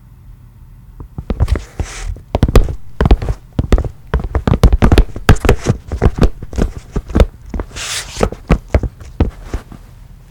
Walking And Struggling Footsteps Tennis Shoes Tile Floor